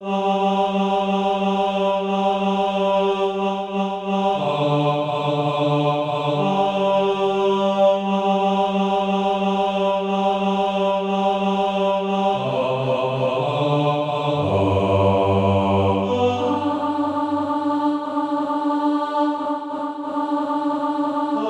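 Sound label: tenor, Practice Music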